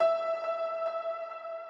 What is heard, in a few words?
piano